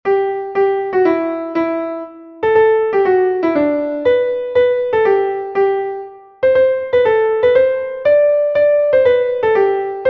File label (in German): ich lieb den fruehling i like the flowers klavier